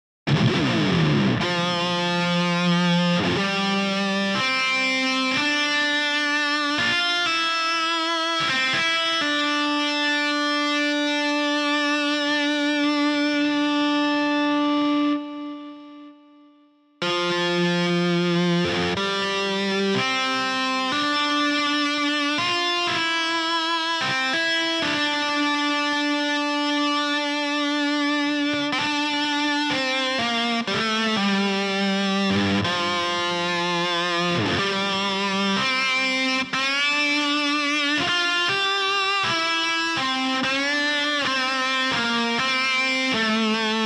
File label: lead bar 123bpm, guitars